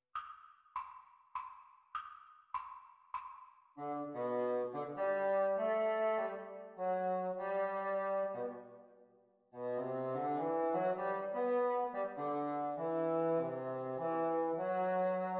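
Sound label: bassoon duets practice speed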